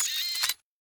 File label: gambling level up; BARs 7s; wazdan